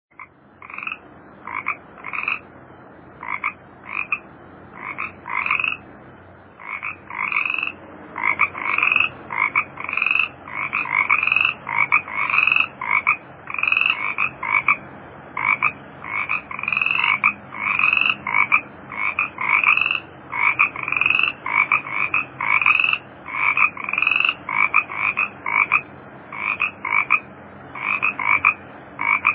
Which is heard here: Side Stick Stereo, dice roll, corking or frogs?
frogs